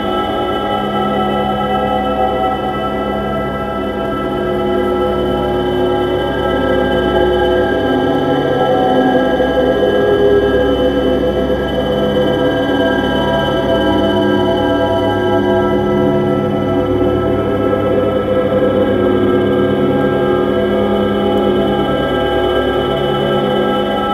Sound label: Space Trench Run Amb; Ambience; Space Battles; Exterior; Warframe